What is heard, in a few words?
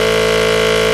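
engine exhale, advanced engine sounds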